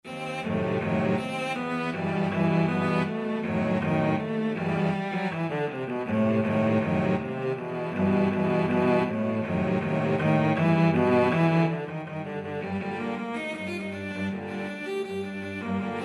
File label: cello ensemble; el choclo